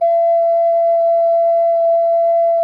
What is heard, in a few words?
ALT F2, GEMSHORN; SoundBytes - Early Patches